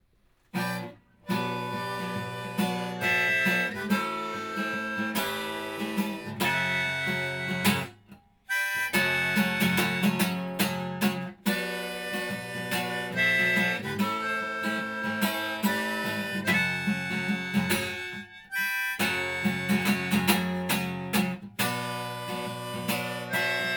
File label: harmonica; Shure KSM8; L KSM8 R camera mic